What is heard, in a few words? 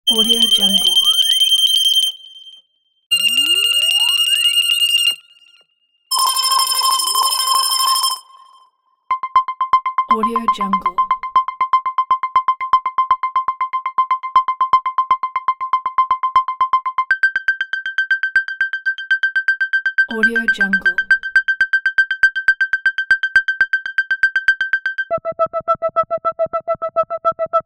retro game count points